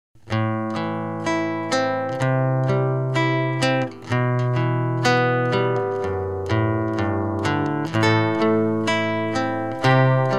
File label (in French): Canon en la mineur